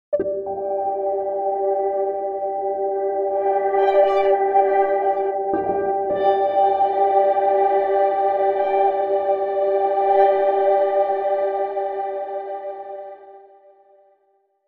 Space Keys; iphone 8

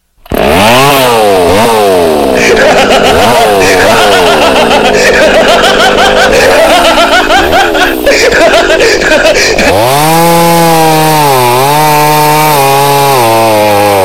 horror

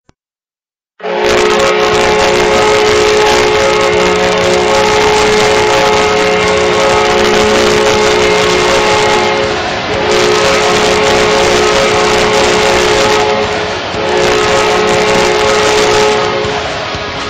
Wild Goal Horn

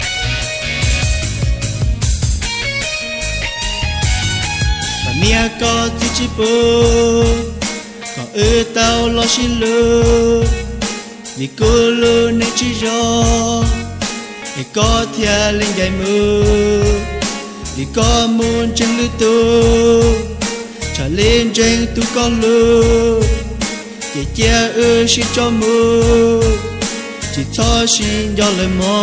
Sad Hmong Song

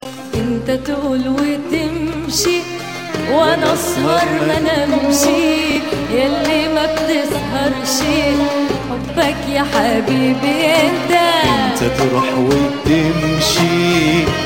Arabia

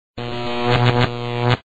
Electricity

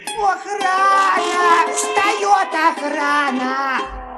Cartoon Song

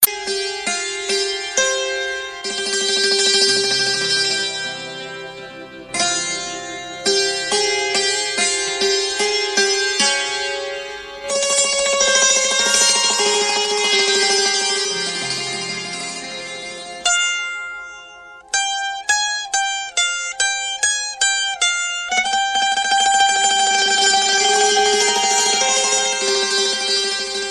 Sitar

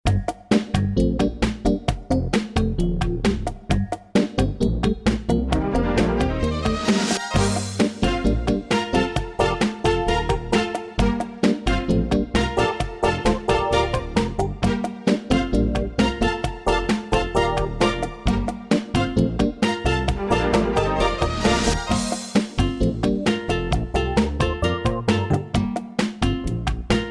Smooth Tune

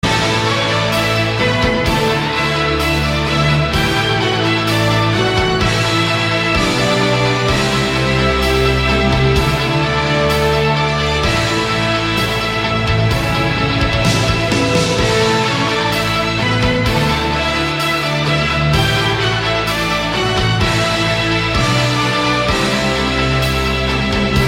black clover music

